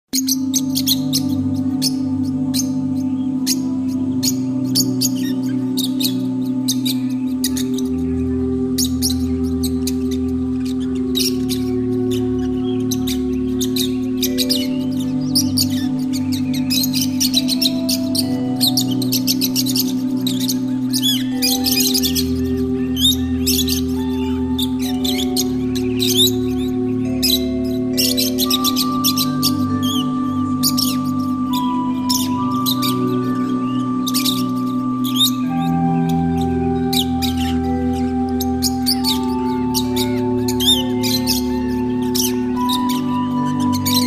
terapi lovebirds

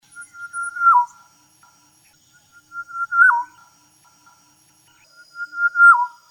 kepodang hutan

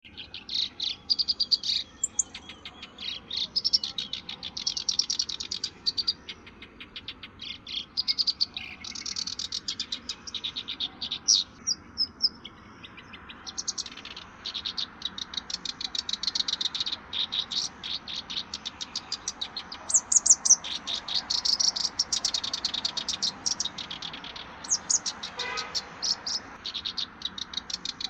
kerakbasi alis hitam

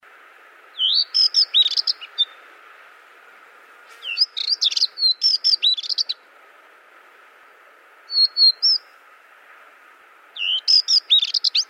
suara kicauan warbler kacamata

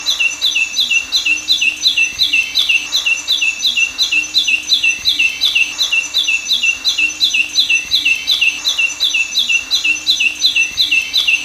suara pijantung kecil

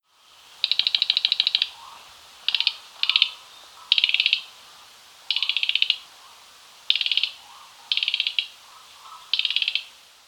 suara sikatan mugimaki call

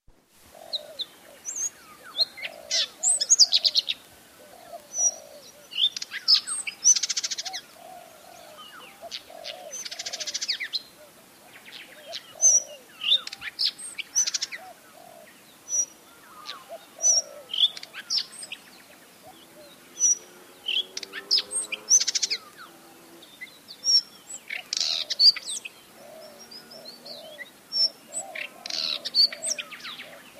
suara ngeriwik decu timor

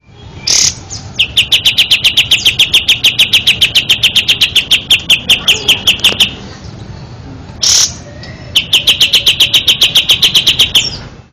kapas tembak

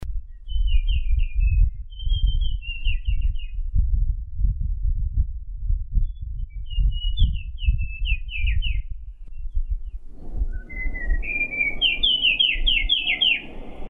suara opior mata hitam

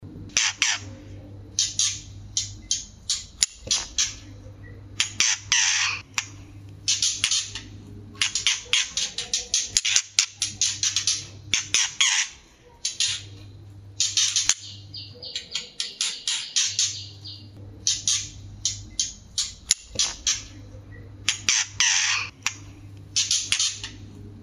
suara murai betina

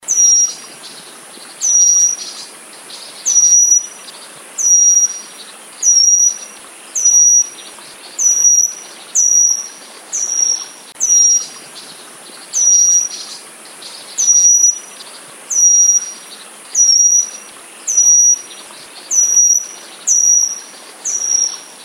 meninting besar call

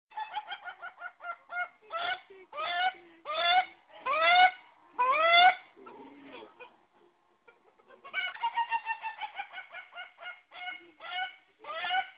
jenis bunyi suara ayam ketawa suara dandut slow

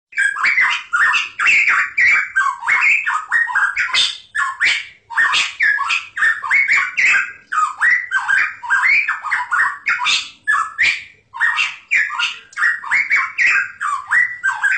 suara masteran cucak rotan

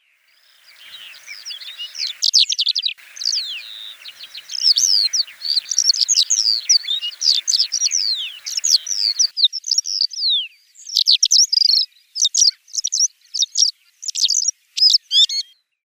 suara black lark

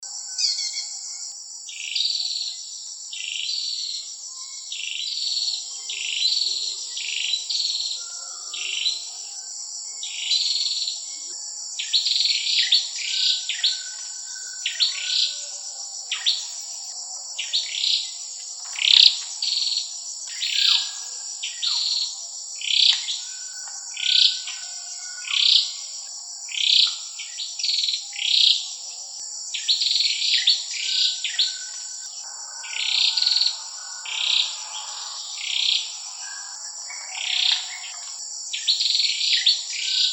cinenen belukar

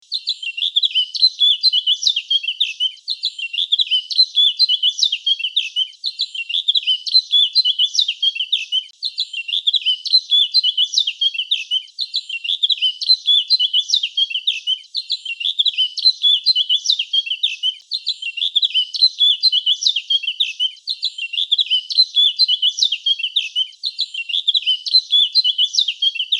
burung blackcup variasi

